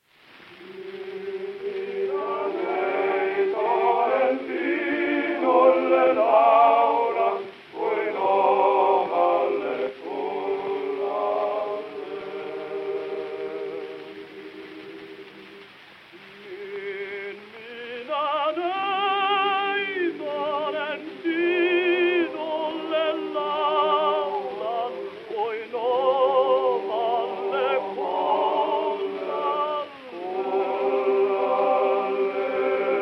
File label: Gramophone